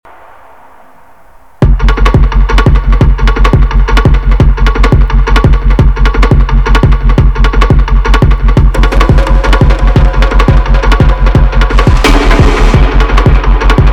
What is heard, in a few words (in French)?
Percussif